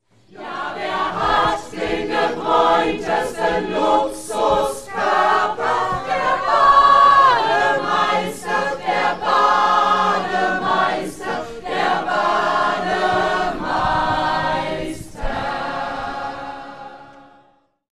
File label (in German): Bademeister Choral